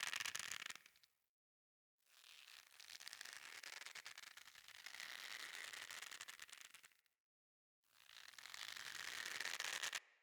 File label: Ode Spike Trap Rope Creak; Objects; Ode Lantern Crate